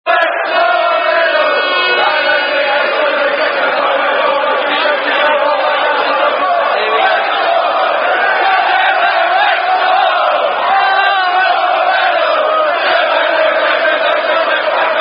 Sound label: Ten Men Went To Mow; Marienplatz